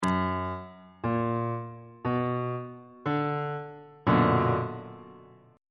a double sharp minor major 7th 2nd inversion on bass clef